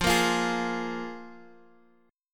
F Minor Double Flat 5th; 10,10,13,11; down; 4G,5C,5E,5A; chord shape

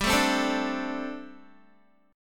G Suspended 2nd Suspended 4th, Guitar; Gsus2sus4 strummed; 14,12,12,14,14,x

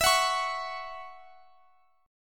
C sharp Minor Double Flat 5th E, chord

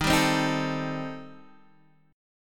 Eb 6th Flat 5th Eb down Guitar DADGAD

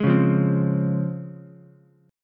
Db Major 7th Db down Ukulele; DbM7 strummed; JGuitar

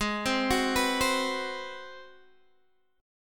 Ab 7th Sharp 9th Guitar Standard, chord; Ab7#9 arpeggiated; JGuitar

